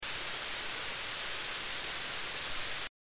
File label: Bigfoot Sounds Single whp